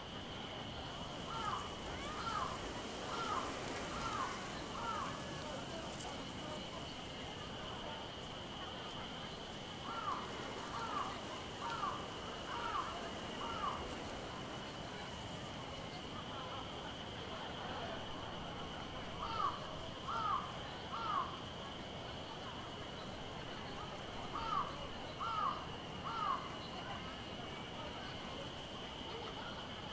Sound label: outdoor event plaza chatter evening; anemoi